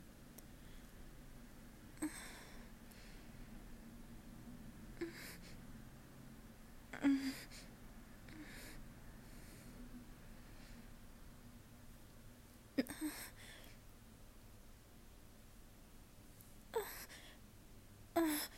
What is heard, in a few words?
its just moans; F4A; i don’t do anything lol